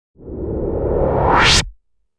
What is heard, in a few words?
Suction Whoosh, Whoosh sound of a vacuum.; Whooshes (Sound Effects:Sound Design)